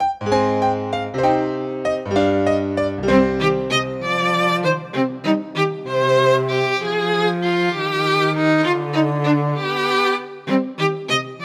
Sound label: vintage riff